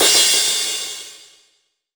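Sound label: cymbal, Drum & Bass; Ueberschall; Drum & Bass Resonance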